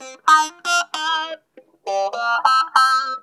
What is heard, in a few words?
NOTE BOX, Funk Guitar; 90 s Sample CDs; Zero G